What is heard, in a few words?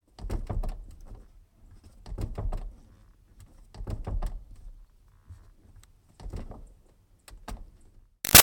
Car Door Hinge Bounce Move; Cars; Vehicles